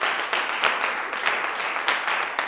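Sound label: CLAPS 96, Gospel; Blues & Soul; G.CLAPS 96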